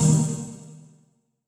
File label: C2, Synth Leads